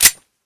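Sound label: slide release, deagle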